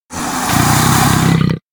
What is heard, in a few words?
spino vox mumble